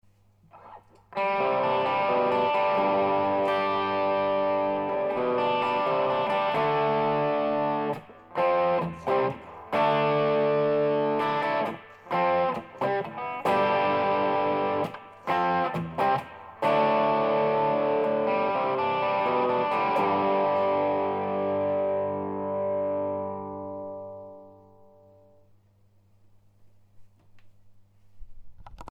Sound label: chords